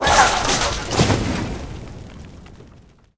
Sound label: death soldier dead, monster